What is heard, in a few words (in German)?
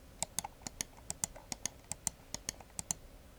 Sound Maustasten